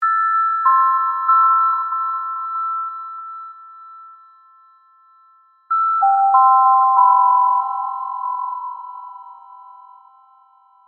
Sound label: Sad music; Among the Sleep